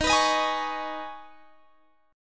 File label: D sharp 7th Suspended 4th Sharp 5th D sharp, Guitar, Standard; strummed; x,18,21,20,21,21; JGuitar